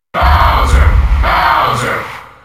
Grito del público; Bowser; SSBM

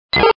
Grito de Pidgey

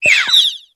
Grito de Nickit

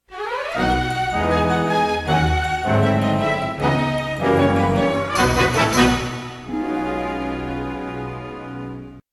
Tema de victoria; Yoshi; SSBM

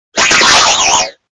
Grito de Silicobra

